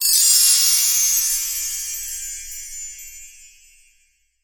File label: sparkle, anime